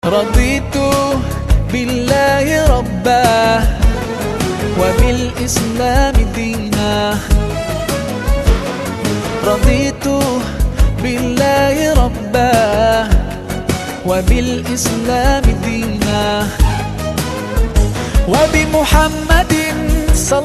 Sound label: (Arabic Version - Bonus Track)